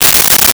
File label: Tape Measure Opened; Tools